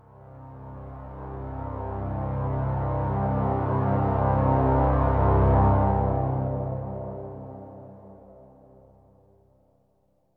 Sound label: SYNTH PAD AMBNT 160 C, Ambient; eJay; Sphere